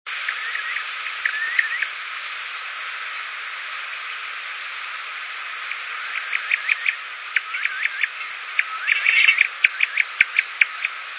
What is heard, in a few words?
bobwhite chicks; faint alarm sound of multiple chicks after running with mother into grasses for safety